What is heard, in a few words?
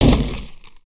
Computer Smash; ambience; Racer; gnarled forest